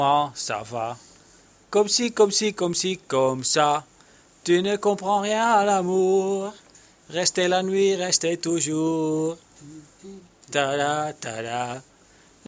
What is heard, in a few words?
wrongtone